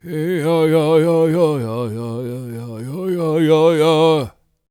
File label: World Voices, CHOCTAW